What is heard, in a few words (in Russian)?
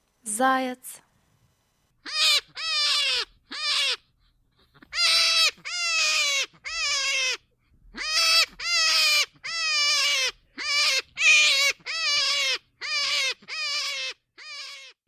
Заяц